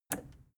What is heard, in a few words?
plastic button scratch release disable